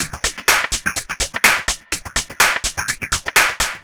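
Dark Dirty No bass drum, Drum Loops 125bpm, Nu Dance Electro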